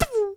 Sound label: Weapons Bullet Hit Wall; liblast